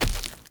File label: Walk Default, Footsteps; Avakot; Friendly